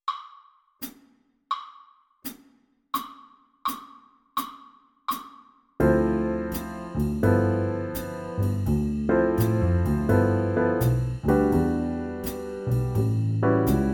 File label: Alto Sax Etude Swing Ballad Play a Long; Jazz-Band; All-State